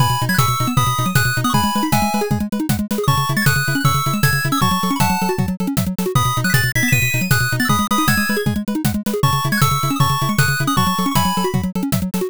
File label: bgm drive